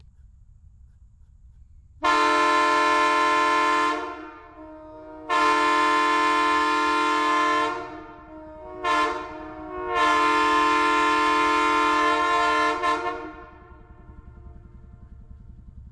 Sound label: Nathan M3H, Horn